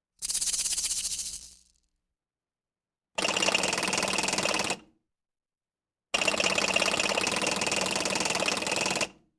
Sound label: Rattle Percussion Rattling; Electa Quinney